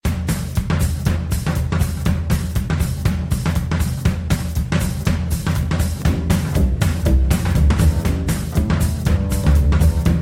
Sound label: Swing Jazz Go Go Drum Bass; Guns, Gore and Cannoli 2